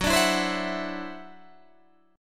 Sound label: E 7th Suspended 2nd Sharp 5th Gb, guitar